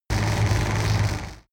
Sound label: extrinsic buzz hard, energie